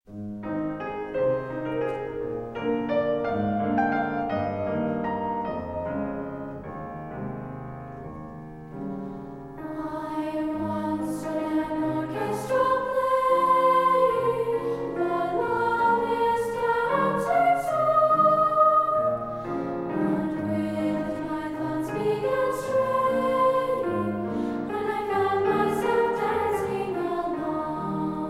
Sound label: Dream Waltz SSA